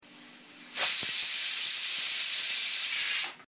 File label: cooker whistle